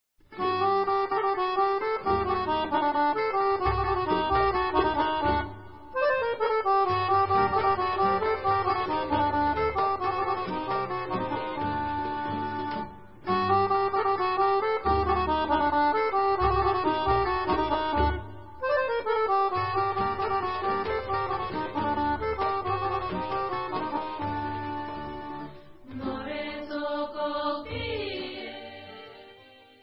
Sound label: More Sokol Pie, Bulgarian